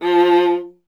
viola F; Miroslav Vitous; Solo Instruments VOL-1

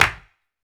CLAP, Human Perc, Body Perc

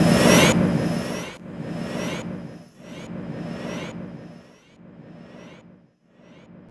noise echo, Drum N Bass; Sonic Foundry (Sony Creative Software); Knutrix Drum & Bass

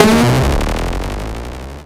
Futuristic Dump Truck, Cwejman S1

